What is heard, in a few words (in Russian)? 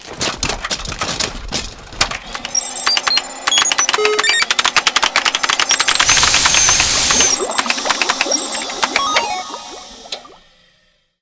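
космический звук подсчета и вычисления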